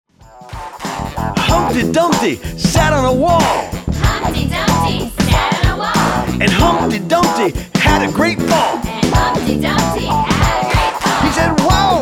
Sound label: funky nursery rhymes